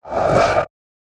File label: horror rising ghosts